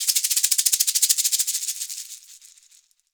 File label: SHKR LEG; ILIO; Synclavier Percussion 2; World & Orchestral